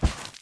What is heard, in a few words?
snowfall; environment; Tribes2